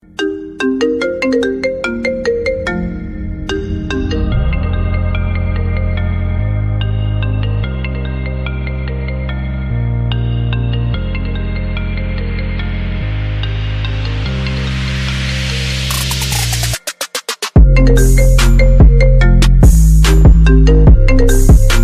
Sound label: Apple Ringtone